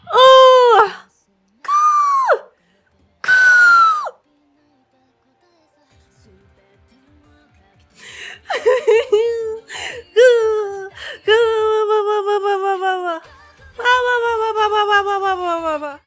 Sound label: fail sound; Eliteronix